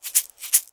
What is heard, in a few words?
MULTI EGGS; Best Service; World Colours